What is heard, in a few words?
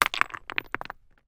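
stone medium large down on other roll; foley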